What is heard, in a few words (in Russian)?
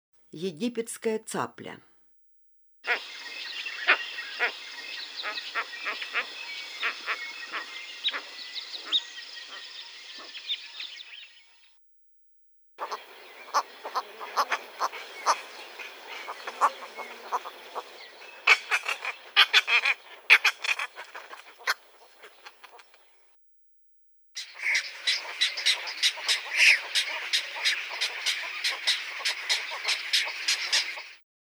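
egipetskaya caplya golos, cattle egret voice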